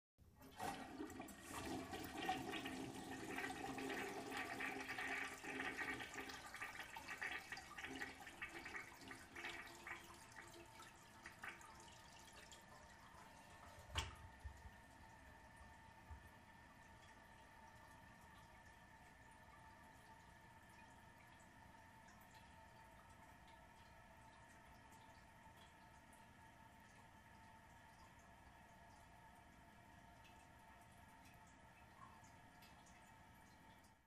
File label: Toilet Closeup